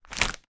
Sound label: food peanuts roasted packet put down